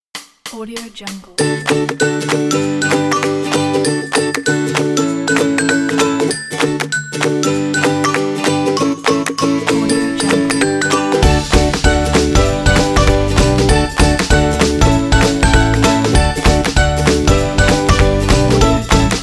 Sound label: happy funny upbeat logo